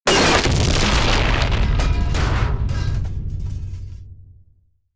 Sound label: Crash